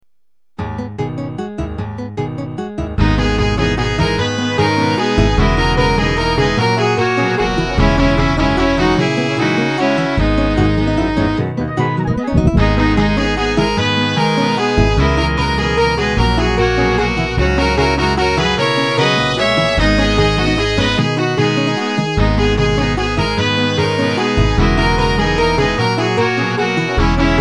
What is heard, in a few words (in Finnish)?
valssi pop